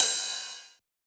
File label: Hi-Hat Heavy, Electronic Drum Samples; E-Punk Project Drum Samples